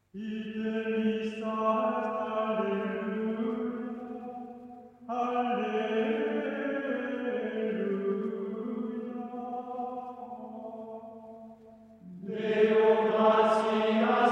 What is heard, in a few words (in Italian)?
gregoriano; canto gregoriano; liturgia pasquale